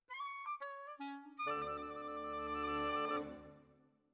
Flute

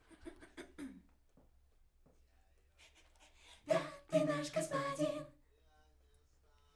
Backs; SCHOEPS

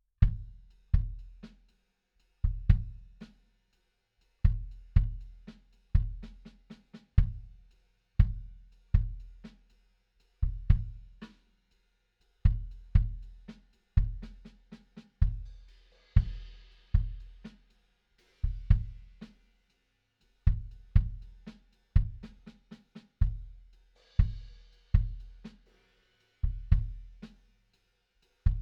BD In

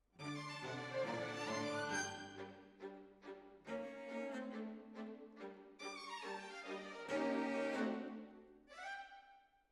Decca, Jazz for piano

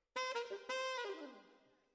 Tenor, RNR for piano

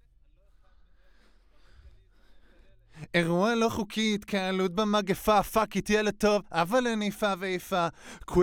Voc

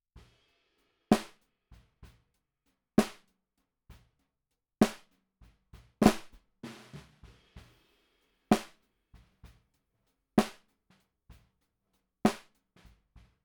SNR Bot

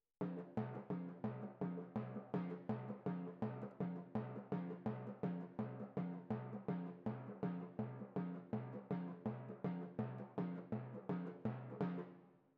Timp Close

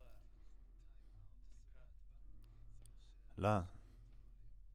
Voc OD

